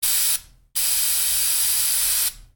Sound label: Graffiti Spray